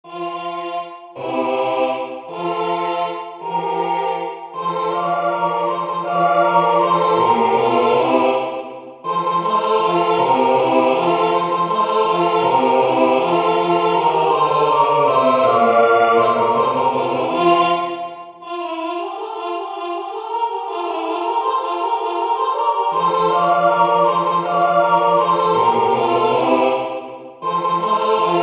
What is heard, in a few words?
Alphabet de Mozart tutti